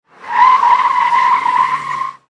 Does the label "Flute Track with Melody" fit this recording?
No